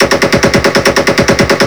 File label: mp40 loop; WAR